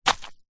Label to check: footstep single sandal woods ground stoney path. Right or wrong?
Right